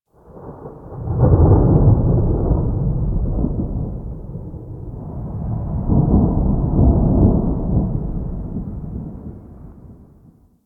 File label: Thunder; Warframe; The New War; Narmer Outpost